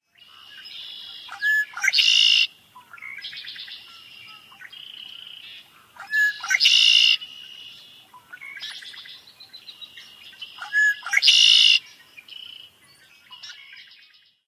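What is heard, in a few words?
songs, Red-winged Blackbird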